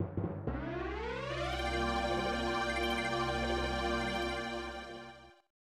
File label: Fanfare; Gulliver Boy; SNES; Opening Credits